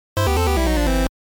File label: game over